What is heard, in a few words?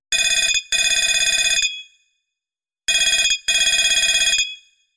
Fire alarm; nokia